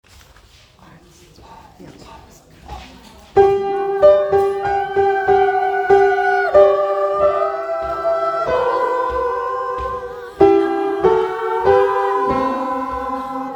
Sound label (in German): Sopran 1 und 2